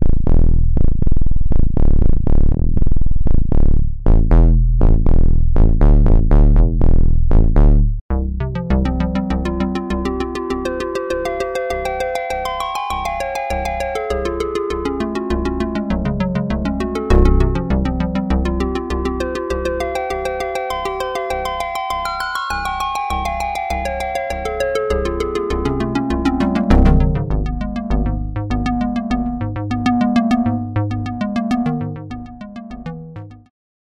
roland juno-60 sub-bass

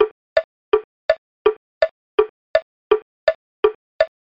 orchestral wood block

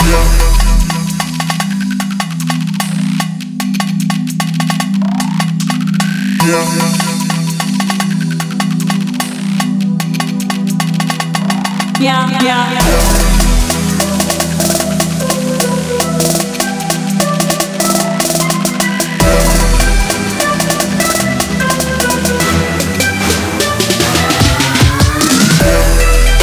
trap monsters kit

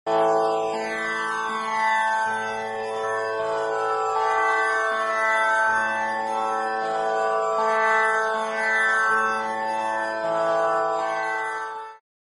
tanpura drone a binaural

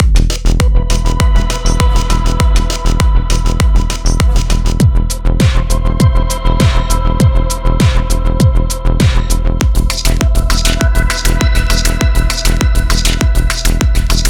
electronic drum loops

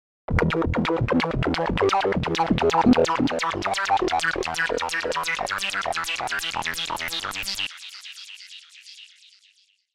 filter key follow bp

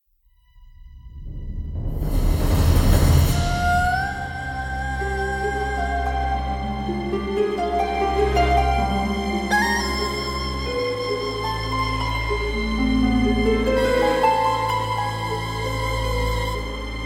erhu

